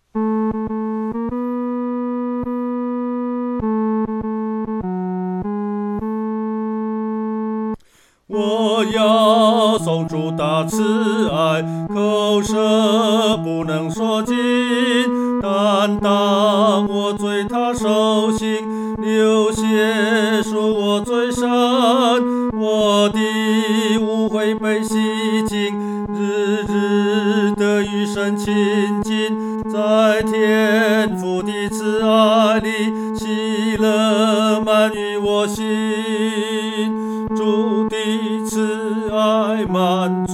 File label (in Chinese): 独唱 第三声; 颂主大爱